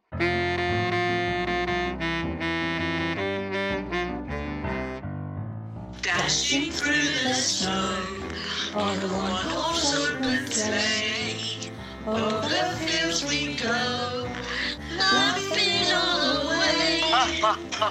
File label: Jingle Bells Christmas Singers; Compass Arts